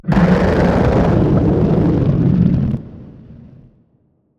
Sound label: ENDWORM idle